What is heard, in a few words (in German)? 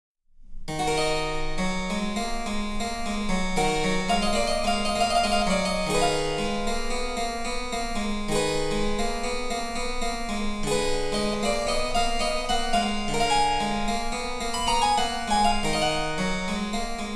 Cembalo; Mark Lindley WTC 1